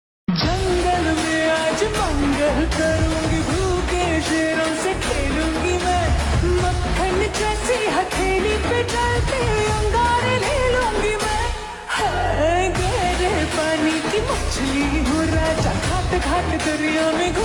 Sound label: Slow Reverb Version Ringtone